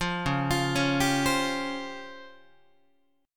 Db Major Sharp 11th down Guitar; arpeggiated; JGuitar

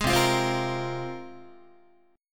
C 7th Suspended 4th Sharp 5th, down, Guitar; strummed; C7sus4#5

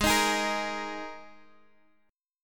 Bb 7th Suspended 4th Ab, chord

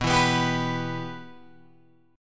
B Suspended 4th Sharp 5th B Guitar Open G

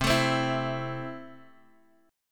C 6th C down Guitar DADGAD

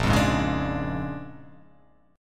A sharp 7th Sharp 9th

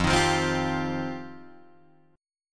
F 7th Suspended 2nd F down Guitar Drop D

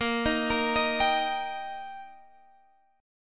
Eb Major Bb

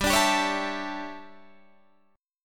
D Major 7th Suspended 2nd Suspended 4th

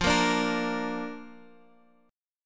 F 6th Suspended 4th F, chord; F64 strummed

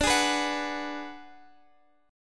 D 6th Flat 5th, Guitar, Open F; x,x,14,15,14,13; strummed; JGuitar

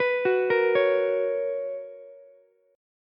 B Major 7th Suspended 2nd Ukulele, chord; BM7sus2; arpeggiated; JGuitar

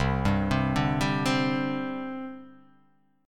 C Suspended 2nd, C, down, chord; Csus2 arpeggiated

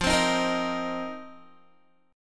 F 7th Suspended 4th F down Guitar; strummed

